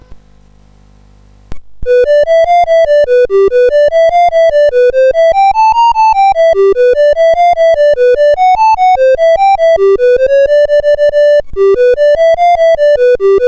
sid CSG8580R5; Maze Graze Game BASIC

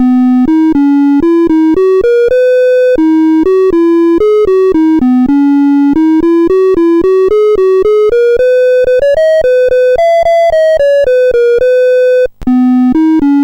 sid CSG8580R5; Magic Jigsaw BASIC

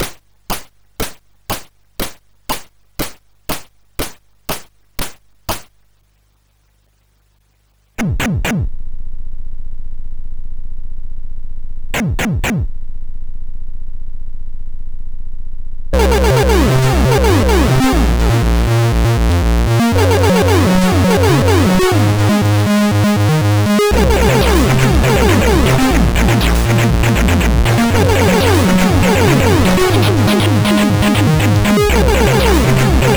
tune, sid MOS6581R3

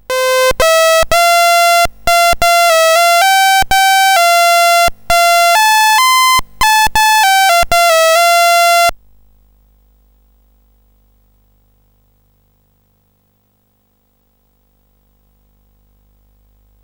Saints to Sinners BASIC, CSG8580R5

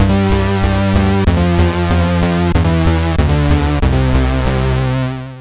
T-Rex 64, CSG8580R5

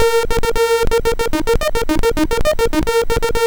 Spaceshuttle BASIC, MOS6581R4

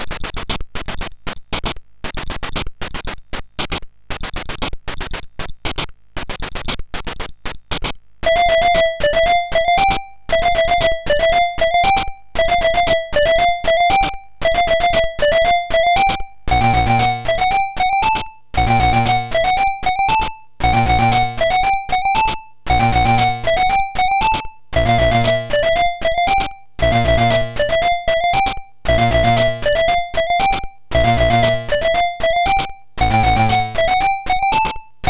sid MOS6581R3

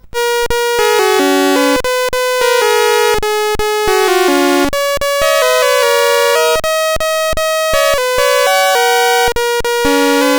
World Cup, CSG8580R5

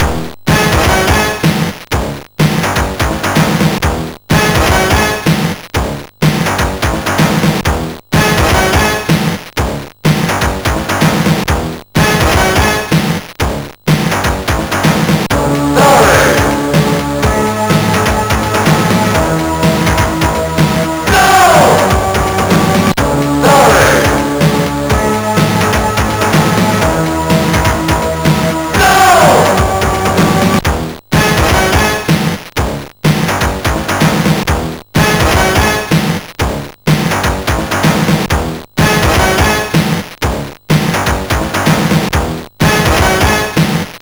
sid MOS6581R4; Starray Digi Demo part 2

